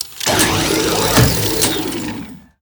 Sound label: Dispenser Engage